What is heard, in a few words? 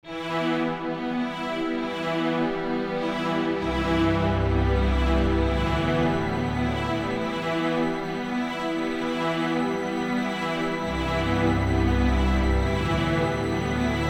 epic drama; Jamie Coleman, Film Composer